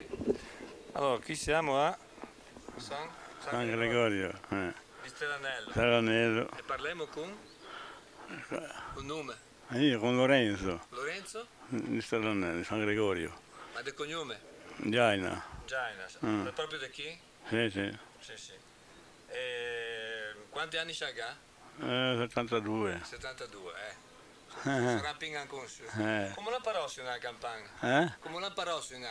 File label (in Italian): Campane, San Gregorio di Stellanello; Savona; Liguria; Archivio Balma Campane Liguria; Polifonia